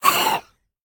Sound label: spitter death; factorio; Factorio 0.14.21; creatures